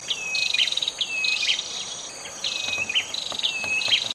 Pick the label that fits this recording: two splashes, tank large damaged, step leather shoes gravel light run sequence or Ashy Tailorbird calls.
Ashy Tailorbird calls